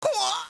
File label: Tiger hit